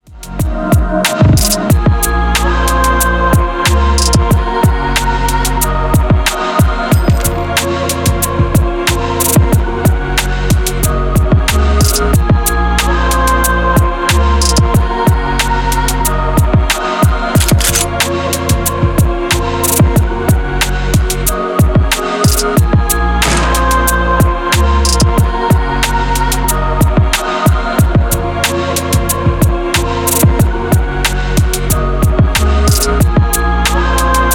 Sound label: pop